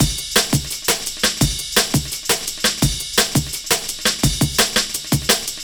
Jazz Break, Drum & Bass; Fluid Dynamics

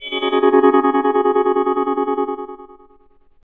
CHORD, Drum & Bass, 130 BPM; Ueberschall; Drum & Bass Resonance

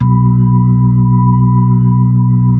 B-3 Chorus

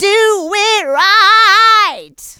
Female Vocals; Houseworx

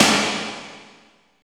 SNR, Tekno Industrial; East West

